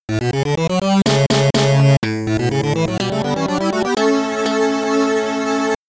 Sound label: Miniboss Beaten